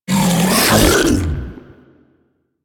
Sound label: bowl devour; Warframe; Warframes; Grendel